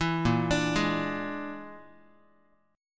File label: Bb 7th Flat 5th Bb Guitar, chord; arpeggiated; 7,6,5,6,x,x; 4A,4E,5A,5D,5G#,6C#; Bb7b5